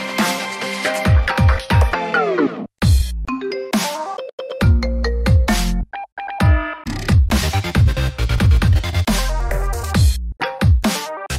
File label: ringtone